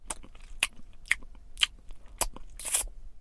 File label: smack lips